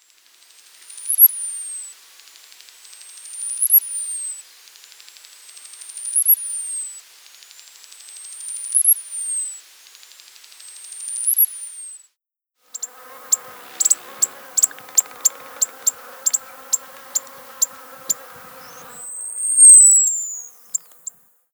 Costa's Hummingbird

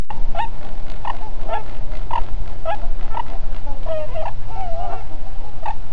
tundra flight call

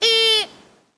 American Pika Call

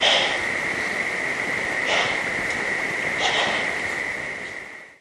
Mule Deer startle exhalation; DPA

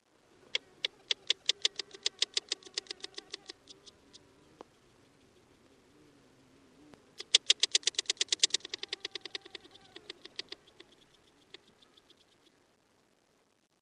White-tailed Prairie Dog

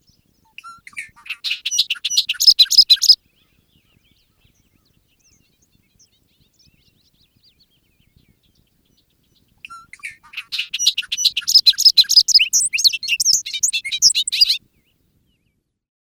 Bobolink

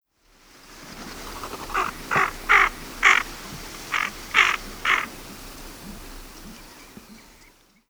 Tufted Duck

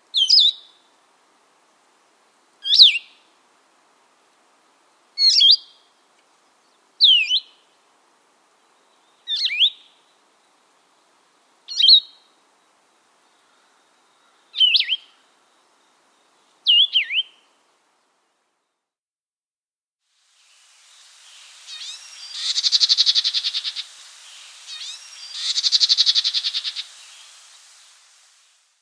Blue-headed Vireo

